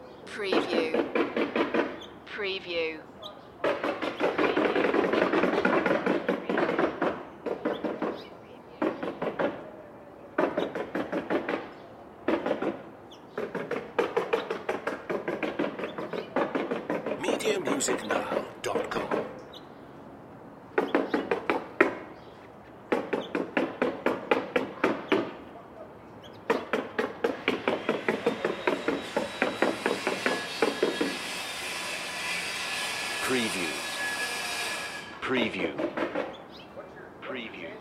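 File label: Contractor Hammer Saw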